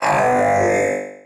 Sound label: Golem Death